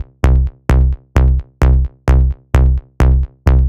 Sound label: Master Bass, eurodance